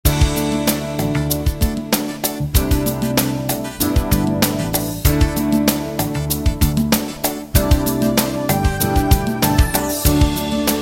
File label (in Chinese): 片头音乐